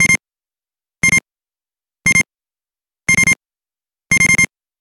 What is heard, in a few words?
bedside, alarms; Nothing Phone (1)